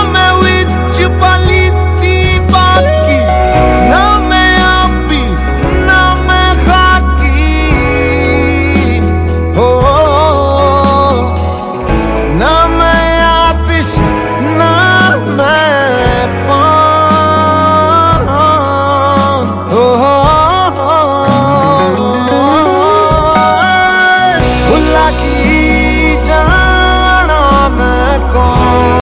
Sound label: male version ringtone, bollywood ringtones